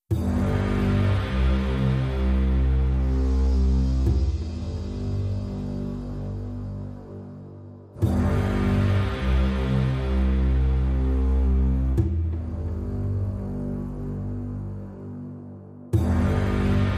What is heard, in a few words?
Drums and Bass Mix